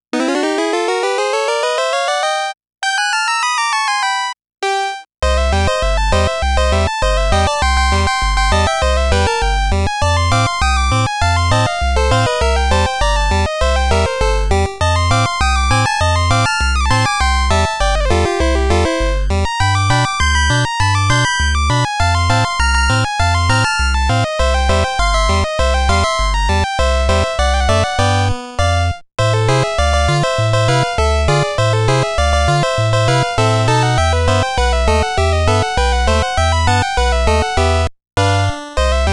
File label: piano